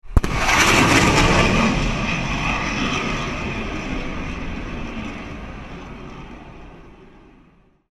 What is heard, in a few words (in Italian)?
Boom sonico aereo